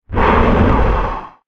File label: Hiss; Barotraumatic; Razzershrimp